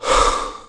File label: Ranger Breath Out